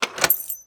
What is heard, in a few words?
unhide exit key